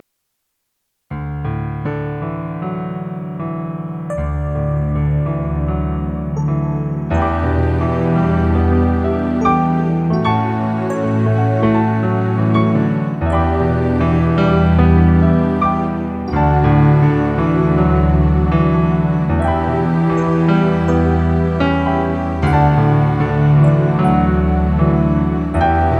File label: Accompaniment without vocal guide